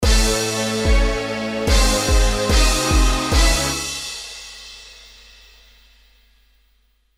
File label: sting